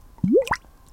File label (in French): Bulle